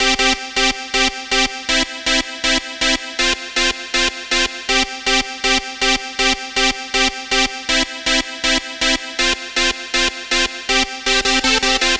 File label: Dance Synths, Hardcore Loops, Synth Loops